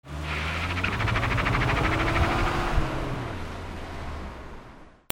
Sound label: HIGHWAY